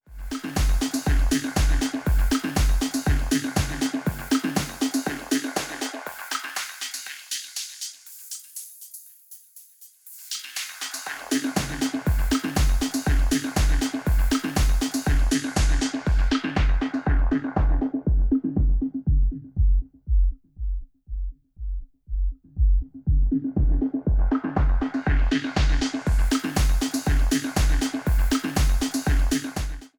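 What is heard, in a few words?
Reloop RMX 90 DVS Filter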